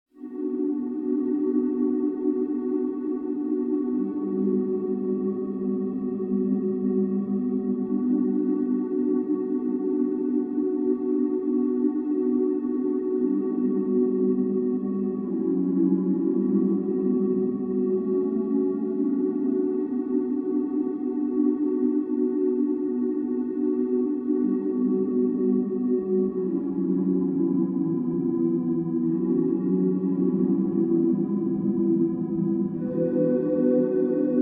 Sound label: Water Flute Background